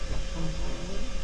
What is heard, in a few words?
dry wipe, Train; Cl323 Unrefurb; OpenBVE